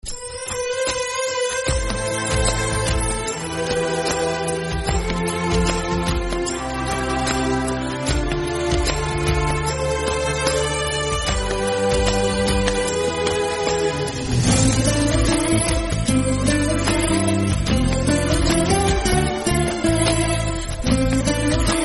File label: Bgm Tamil